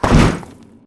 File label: Small Concrete Wall Stop; Boomtown; Titanfall 2